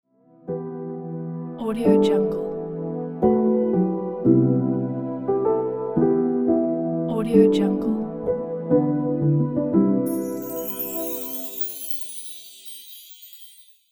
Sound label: inspiring piano transition